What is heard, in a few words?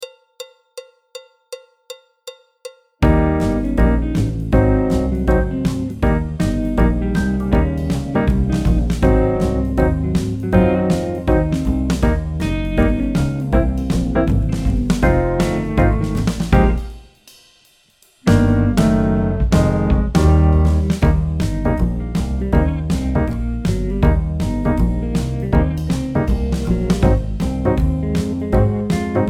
trumpet; Jazz Syllabus Level 1